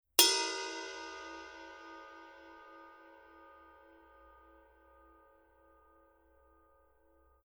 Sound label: Color Sound 900 Red Heavy Ride Paiste